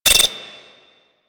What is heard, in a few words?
Grenade Critical Loop; Warframe; Corpus; Jackal Redux; Weapons